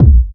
bassy steel kick drum a sharp key, Royality free kick one shot tuned to the A# note.; kick drum samples